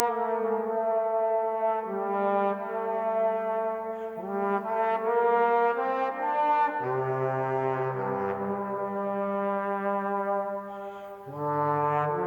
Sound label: brass riot; lyria